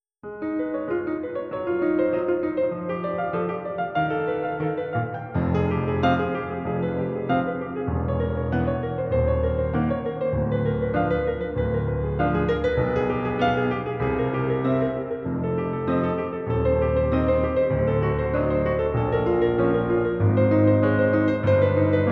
Praeludium (rhythmic version), Piano

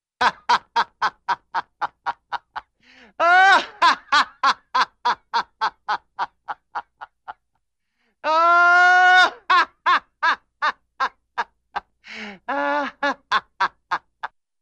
Man Laughs w sarcastic Undertone; Hollywood Edge Cartoon Trax

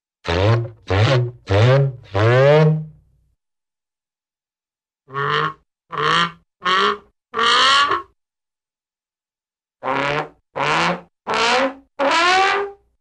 Horn Ascending Four Times (ie Sneeze Build-up); Hollywood Edge Cartoon Trax

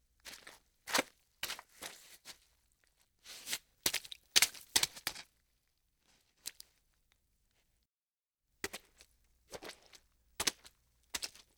Footsteps Wet Mud Squish Slap; Foley; Foley Essentials

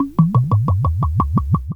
whisky, kitchen; 1000fx

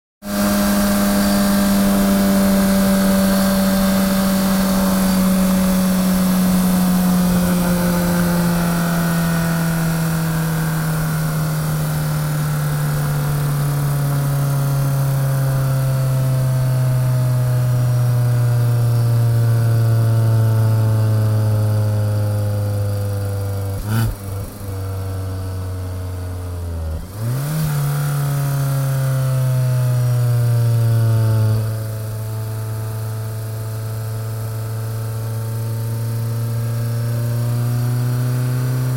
Sports Car Long Decel Idle with Revs; Cars

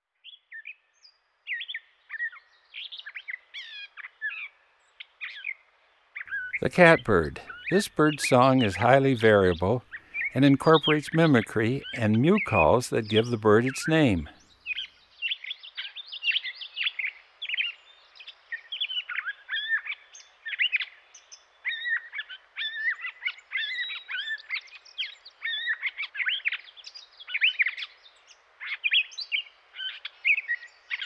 Catbird; Eastern North America; Dan Gibson; Wildlife Identification By Sound

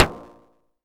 METAL HIT, FM SYNTH; 1000fx

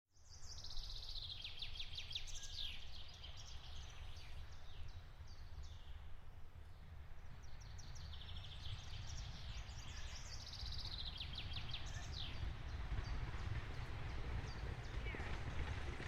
Horse Drawn Carriage By Fast; Hollywood Edge Historical Series

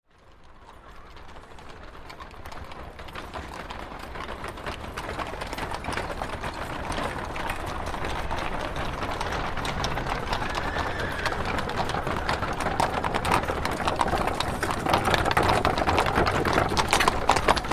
Horse Drawn Carriage Very Slow; Horses Carriages and Traps; Hollywood Edge Historical Series

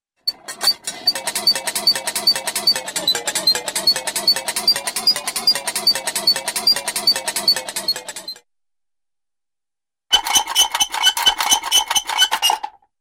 Rapid Metallic Squeaks; Hollywood Edge Cartoon Trax

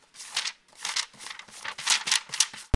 turn page, reading book; 1000fx

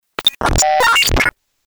April Break, Breakers & Transitioners; Best Of N2O Library

